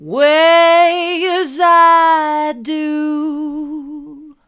hard, vocal

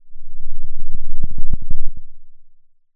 pop

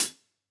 close Cl Hat, drum

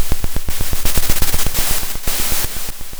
pop, Sound of a drum roll

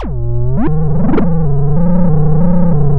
pop, chainsaw

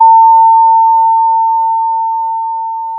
Sound of a school bell

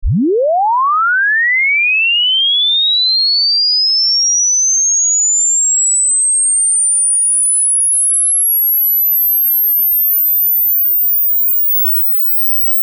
sweeping pure tone softer

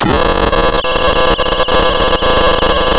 Sound of tearing paper, center

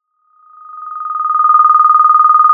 pop, Sound of a phone ringing

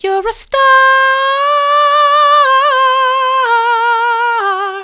your a star mid, vocal

